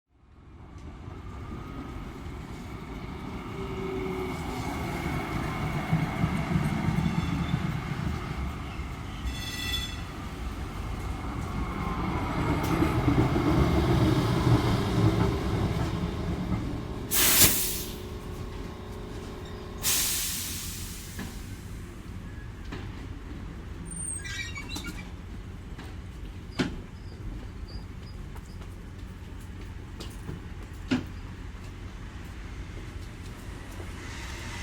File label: NETHERLANDS TRAM